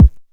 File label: short kick one shot d key, kick drum sound tuned to the D note